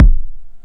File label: modular kick